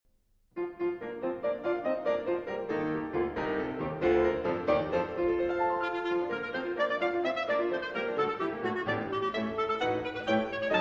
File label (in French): Clarinette